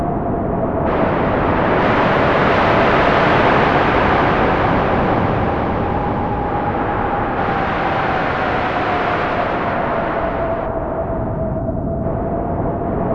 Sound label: stereo wind; Zero-G Ambient 1; STEREO WINDS